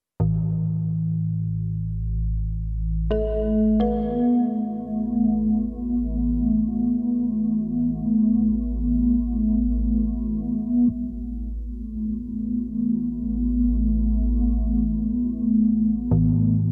oddech natury 432 hz harmonia i odprezenie

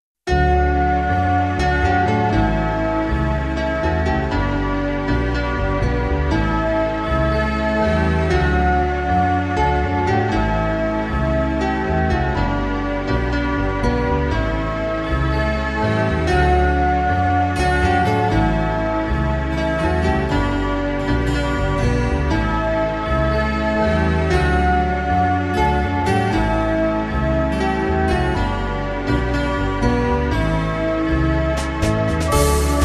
melodia dzwonow, 432 Hz

